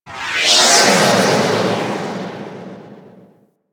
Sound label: Exterior Solar Winds, Ambience; Warframe; Corpus Railjack